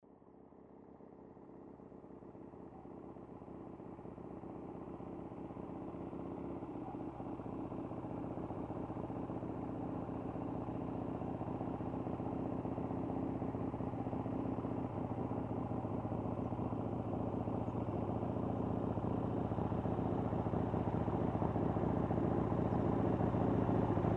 Harley-Davidson motorcycle approaching, waiting, turning off